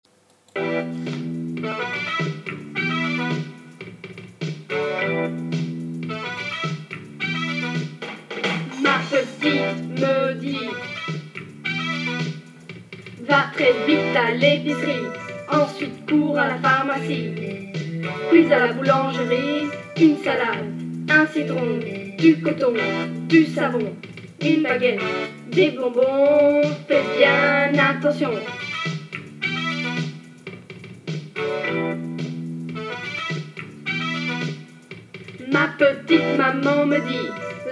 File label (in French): chanson rap; aux magasins